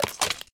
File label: Pick Up Spear